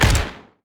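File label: Soldier shot; Ion Shift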